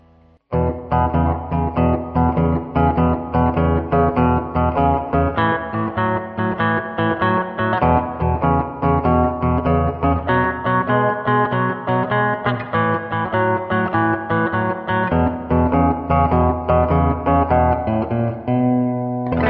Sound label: rhythm e, 12bar